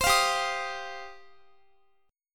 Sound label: G 7th Suspended 4th Sharp 5th G down Guitar; Listen to G7sus4#5 strummed; G7sus4#5 Chord